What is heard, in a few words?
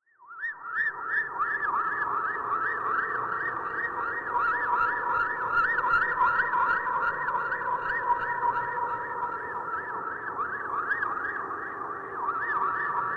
Lost Space Screams Puls, alien swamp